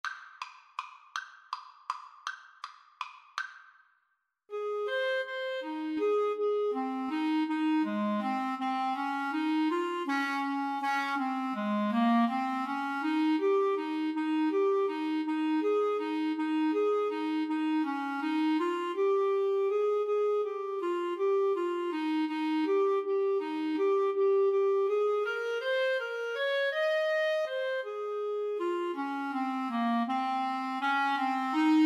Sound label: Clarinet Duet